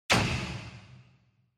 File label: Spotlight Short